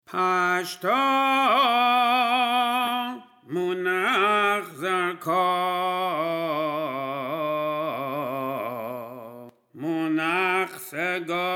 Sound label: Taamei Hamikra; טעמי המקרא